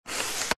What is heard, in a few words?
technology camera dslr telescopic lens turn adjust slide nikon d5200